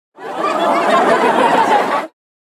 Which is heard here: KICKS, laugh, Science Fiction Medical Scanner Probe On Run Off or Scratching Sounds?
laugh